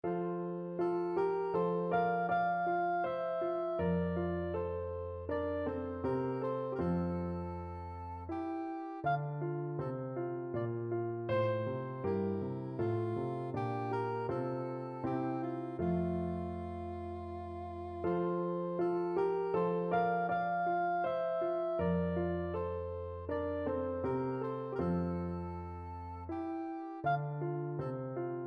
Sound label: dance, soprano saxophone; gluck